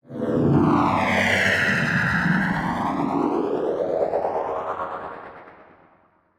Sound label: Sci Fi Swoop